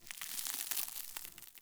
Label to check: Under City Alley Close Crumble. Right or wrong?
Right